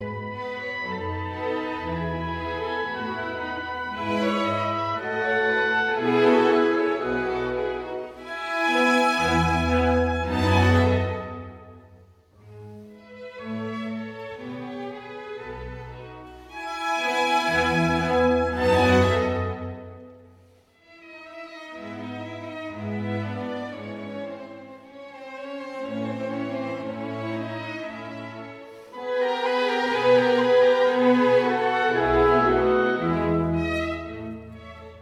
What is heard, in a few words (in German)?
violinkonzert nr 5 a dur adagio